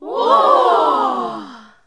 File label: NOISE, CLASSIC VOX; Best Service; Hallelujah